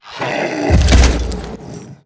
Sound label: salamander death; Akeron